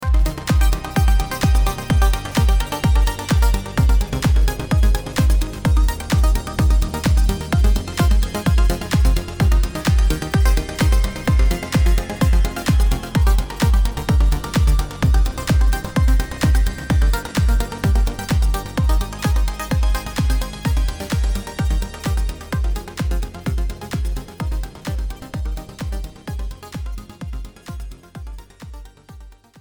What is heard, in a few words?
Synth Pop Mix DRY